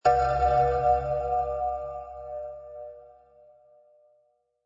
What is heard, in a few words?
Creepy Gong, Creeped out gong sound.